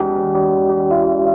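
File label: KEYS loop L, Drum & Bass 175 BPM; Ueberschall; Drum & Bass Flash Trackz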